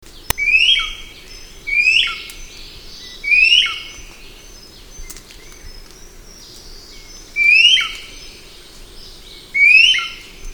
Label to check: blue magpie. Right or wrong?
Right